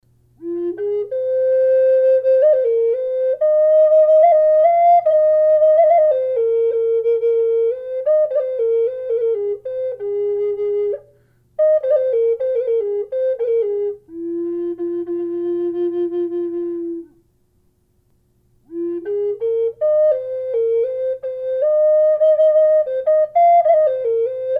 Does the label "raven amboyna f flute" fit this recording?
Yes